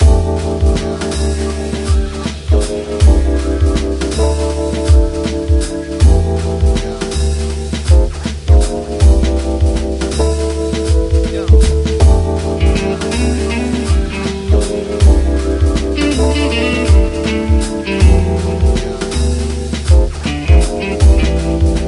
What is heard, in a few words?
Ripples, Ringtones